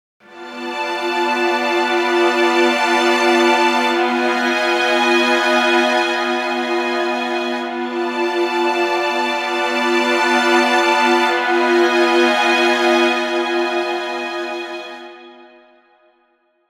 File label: high strings; altered chords; altered vii